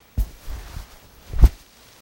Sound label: Bear Paw Movement